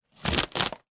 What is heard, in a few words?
cardboard sheet rip; foley